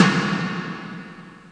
snare, drums

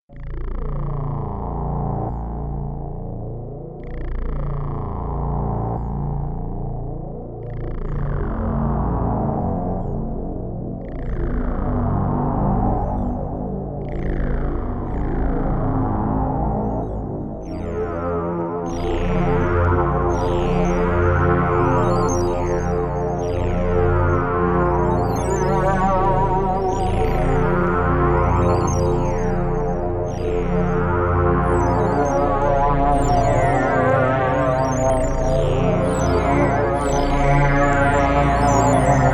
21edo; diva

